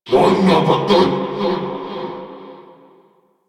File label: Rally Cast Vocal; Warframe; Warframes; Hoplite